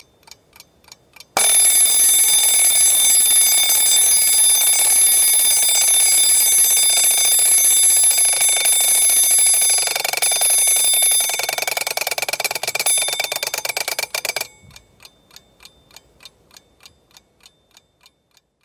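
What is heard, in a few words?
mechanical alarm clock native sound of a mechanical alarm clock; mehanicheskiy-budilnik-rodnoy-zvuk-mehanicheskogo-budilnika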